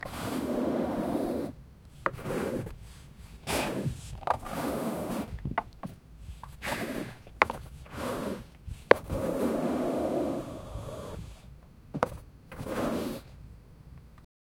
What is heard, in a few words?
a snowboarder jumping on, outdoor